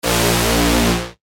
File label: BSHOT A#; Spring; revealed bass shots vol 4